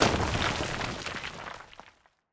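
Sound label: Golem Hit Explosion; Golem Trans Hit Expl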